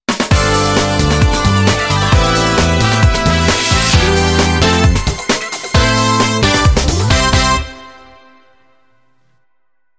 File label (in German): jingles; 9live